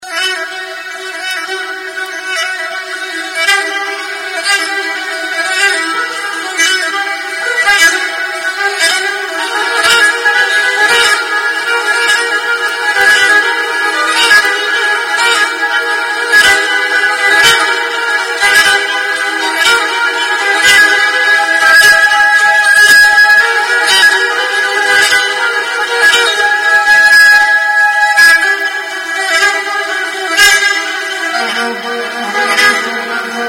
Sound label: disco trapezoidal violin